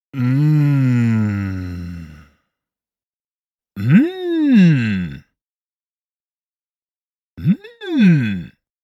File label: Mmm, Male voice saying Mmm expressing pleasure of food.; Eating (Sound Effects:Human)